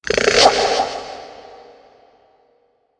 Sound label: Forest Amb Rand; moul